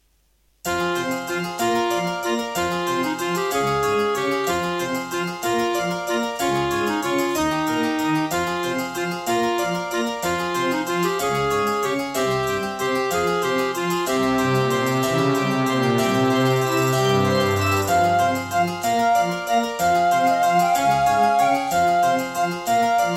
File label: Serdaco MP32L GeneralUser GS Bank; Death Gate